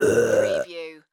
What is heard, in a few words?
Burp Female; sound-effects-bible